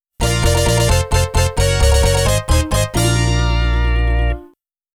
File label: Winning Fanfare; Dream Co., Ltd.; Ordyne; Shinji Hosoe Works Vol.2